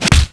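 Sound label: medic attack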